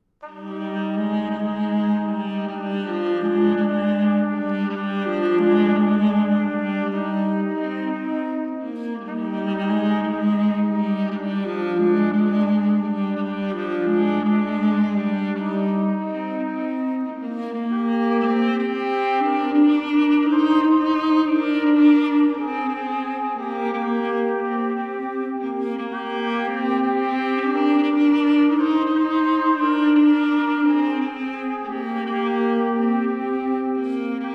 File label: Barcarole Duo, viola trompete duo